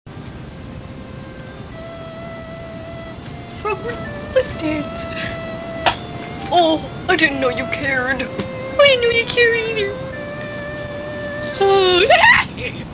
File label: waltz